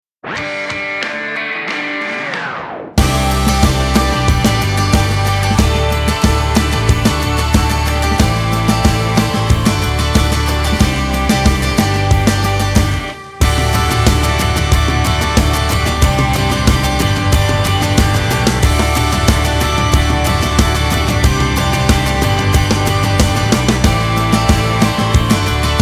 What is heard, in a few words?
so far so true instrumental